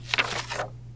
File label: paper; PC Gamer IT CD 31; BlackDah